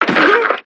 Sfx Americanfootball

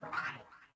Death; Folk Hero

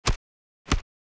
Knocktaur Run

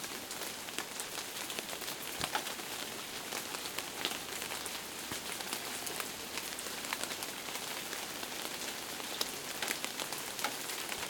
Rain, Vegetation, Light, Forest, Hit Leaves

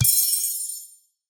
Enemy defeat; Touhou Jitsuzaisou Dream Logical World

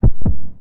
Heartbeat; Heartbeat B 03

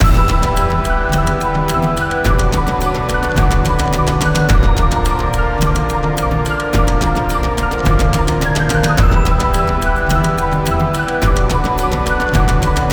Music BGM loop; Wenjia

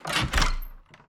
Door open

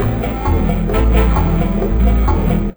Ringtone; Smeshariki Museum of Communications

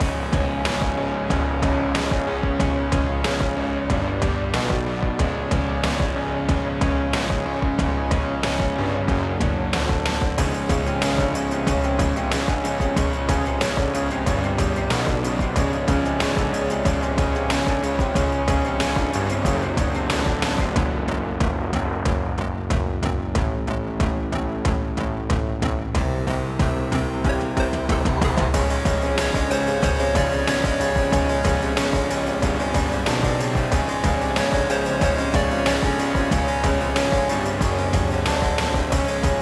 Castle Battle Loop; Folk Hero

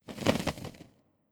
Hawk Flap; Hawk Flap Multi4

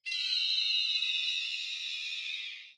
eagleboss Baby Cry; unsighted; enemies

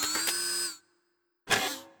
Mantis Blade

